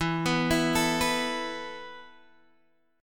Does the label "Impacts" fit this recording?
No